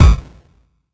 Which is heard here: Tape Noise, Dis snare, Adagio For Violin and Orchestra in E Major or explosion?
explosion